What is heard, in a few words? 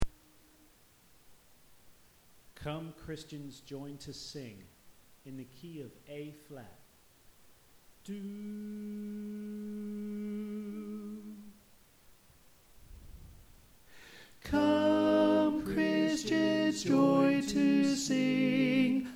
Alto; Come Christians Join To Sing